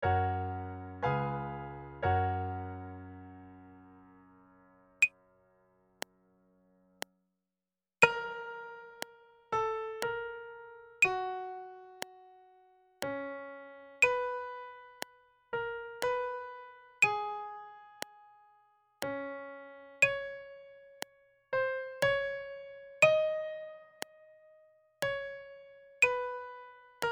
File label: Prepared Melody; MUS 240 Practice Sheet 3; advanced musicianship